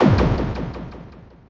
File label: wrong Sound; SpacePetsSkipCounting